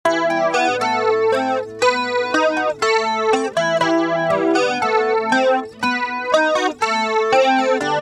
ac gtr mistress

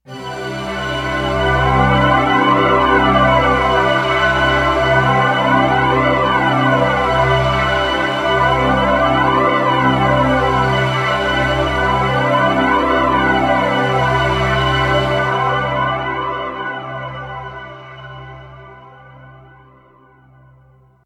poly pad destination rotation

